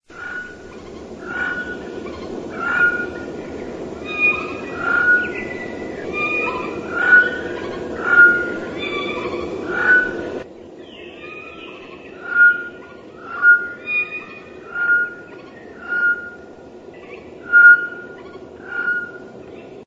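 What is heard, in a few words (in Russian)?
sojjka, jay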